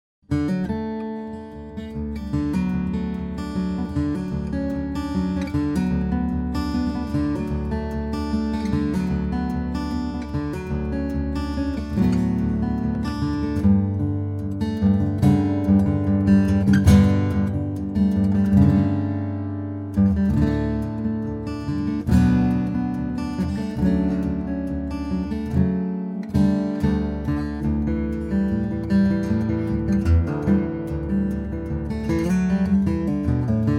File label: guitar